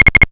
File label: Hard PCM Chip; chiptune